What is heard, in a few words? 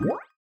spawn; Epic Toon FX; MiniGame-PushPush